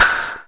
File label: Claps & Snares